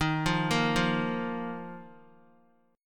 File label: D sharp Suspended 2nd, Guitar; arpeggiated; JGuitar